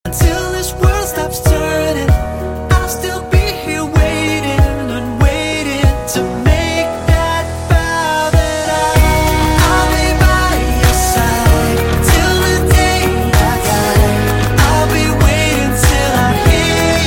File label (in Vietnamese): Nhac chuong